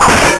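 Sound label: knight attack; Neustria2D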